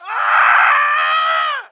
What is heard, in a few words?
burn dying; Emperor - Battle for Dune